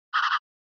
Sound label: Tire Squeal; SNES; Racing